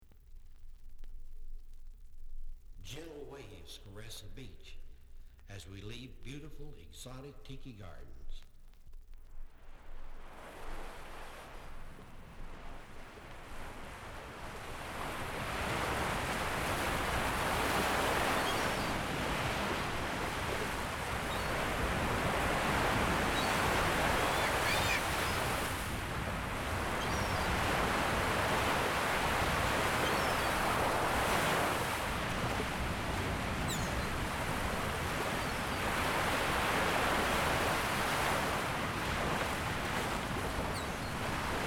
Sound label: Selected Sounds of Tiki Gardens